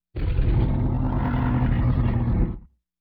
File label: Monsters Dinosaur Growls; Stair Horror